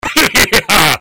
hehehe

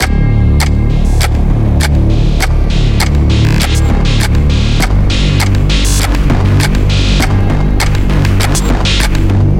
death; tekpro

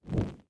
light fire; adarkroom

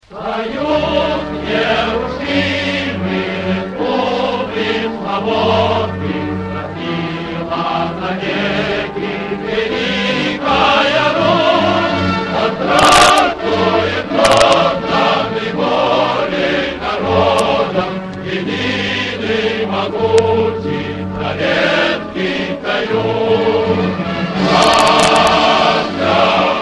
soviet

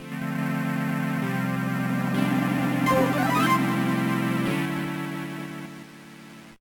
victory; pizzatower

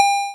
coin; terrifried

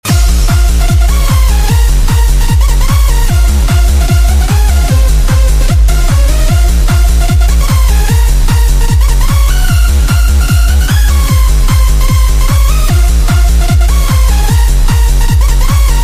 music gameplay; geometryrash

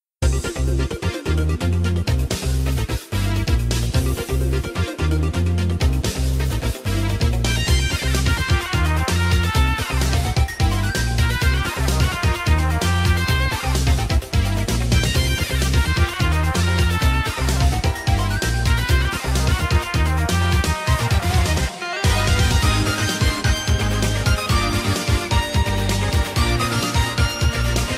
music hydro; pizzatower

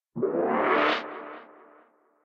transition; ovo

